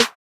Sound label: Claps